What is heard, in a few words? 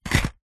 household dvd case disc inside set down carpet